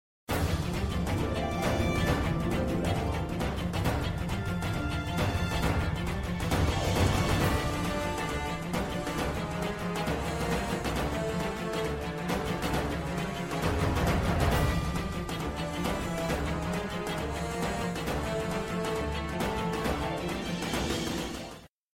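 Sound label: Boss Background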